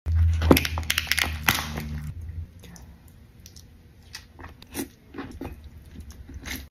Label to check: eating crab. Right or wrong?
Right